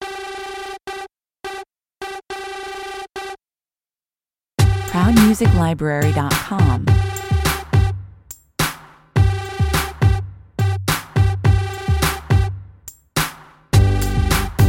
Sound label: hip hop